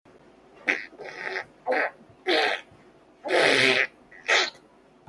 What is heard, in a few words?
fart sound effect big bean version